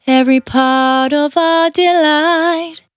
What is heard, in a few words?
Finally 70bpm C, Velvet Vocal; Zero-G